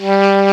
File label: SOFT SAX 3; AKAI S-Series CD-ROM Sound Library VOL-8